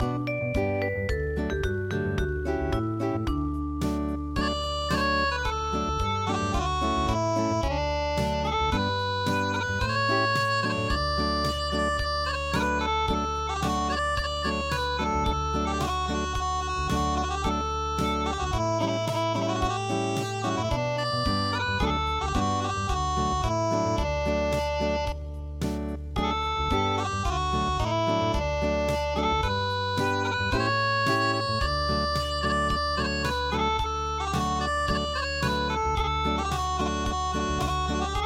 Joy To The World Unplugged

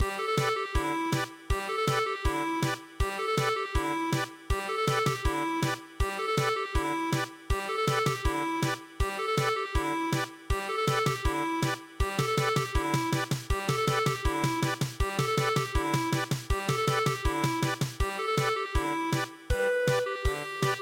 German Polka

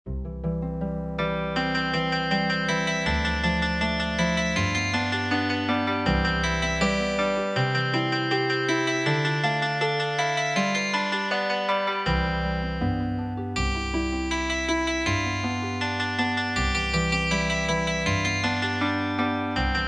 Healing Harp

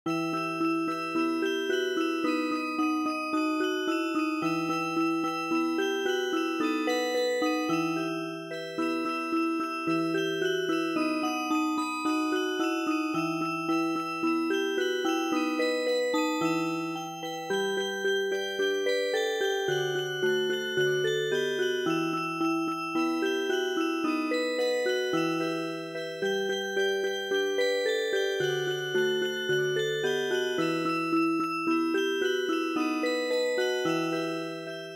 Music Box, traditional

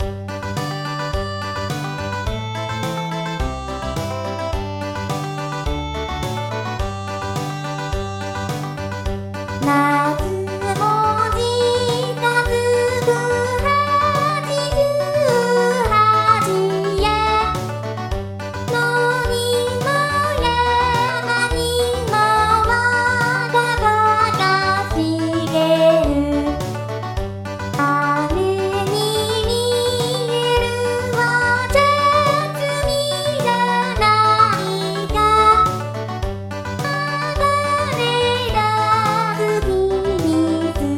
Tea picking, vocal; 鏡音リン

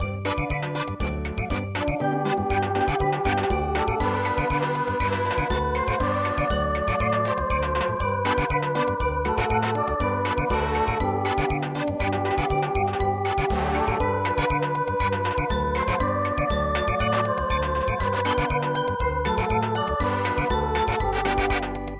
Samba

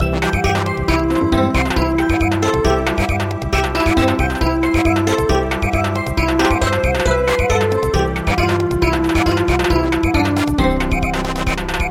Samba; Umupo Po Kayo

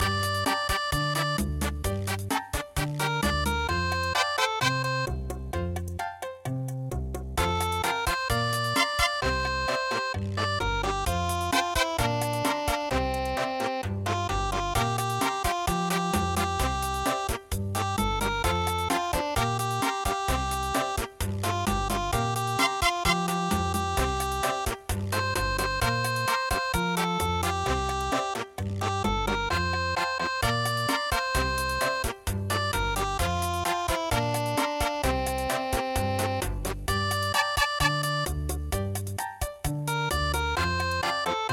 Cha Cha Cha

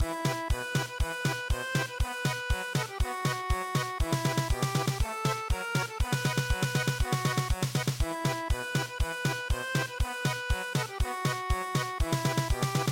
German Polka

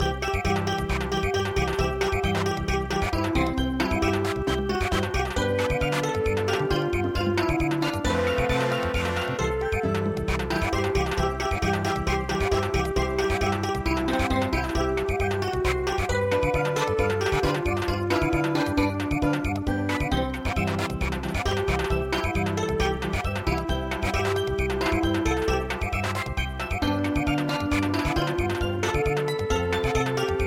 Samba, midi; Hail To Britannia

